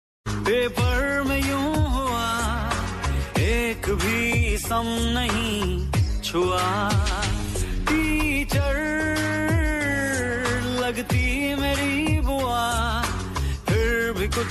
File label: Romantic Hindi Ringtone; ringtones